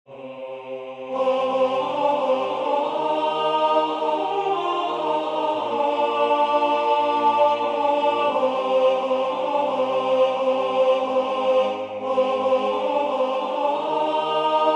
Regardez l'humilité de Dieu alto

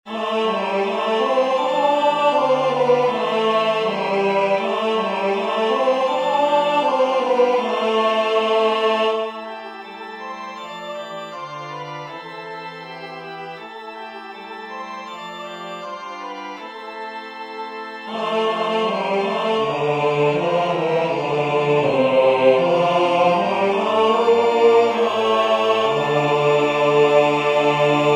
Jeanne pur joyau de France basse

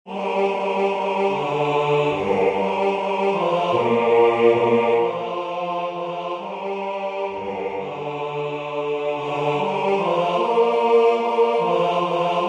basse; Jan Josef Bozan; Nitida Stella

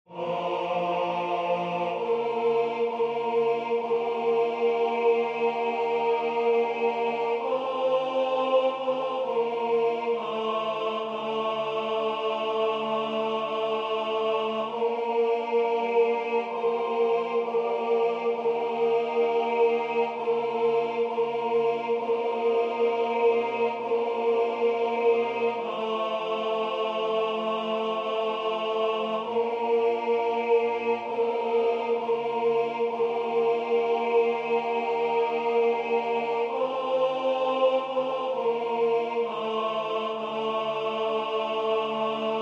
Prosternez vous tenor

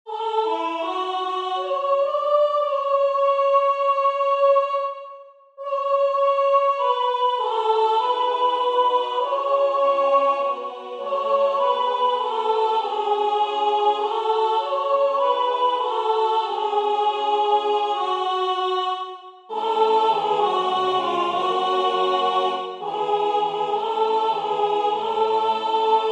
soprane; Ave Maria Victoria

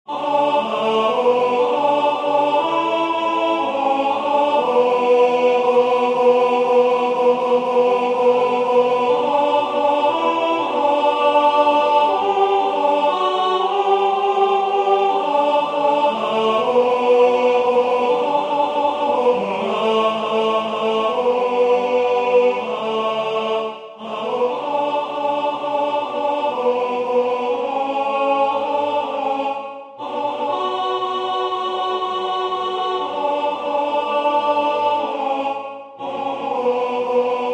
Voici le Corps et le Sang du Seigneur alto

